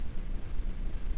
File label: Tape Noise; layering